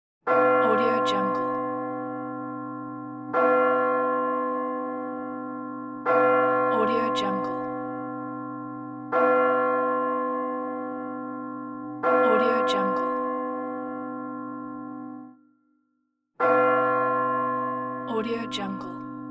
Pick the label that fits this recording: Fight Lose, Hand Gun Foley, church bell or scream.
church bell